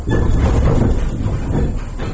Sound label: start, vehicle